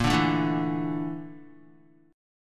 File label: Bb Major 7th Flat 5th, Guitar; BbM7b5 strummed; JGuitar